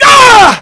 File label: human pain; cstrike; zombie swarm